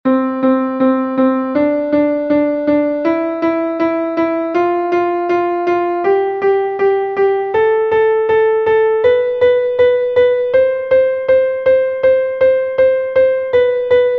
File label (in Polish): Gama C dur